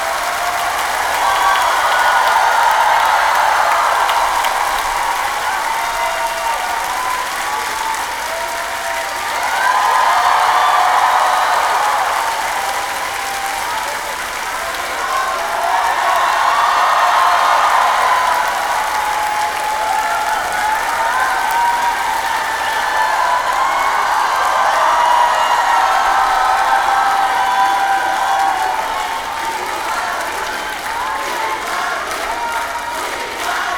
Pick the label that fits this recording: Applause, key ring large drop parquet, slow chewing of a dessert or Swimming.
Applause